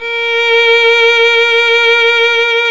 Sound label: STR VL A#5, solo section; Roland - LA Composer vol. 1